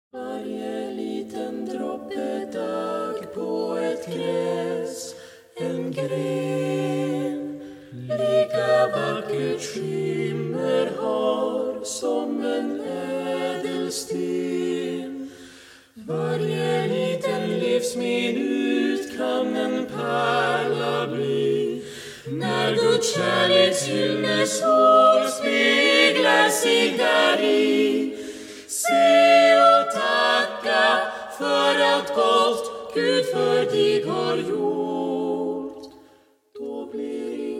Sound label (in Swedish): 5vokalensamble; vaxjo stift 1942